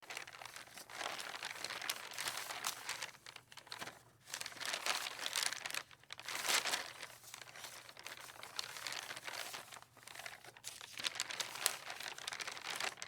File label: papers rustling in the wind